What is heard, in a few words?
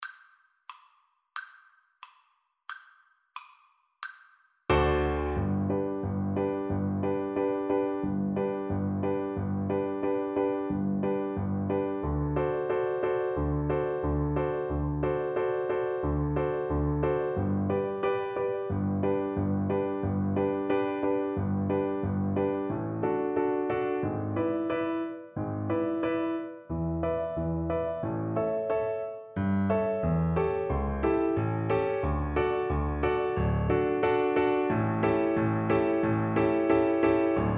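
viola karaoke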